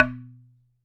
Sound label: Wooden Bar Strike